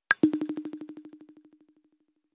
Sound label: message